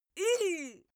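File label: female pain, screams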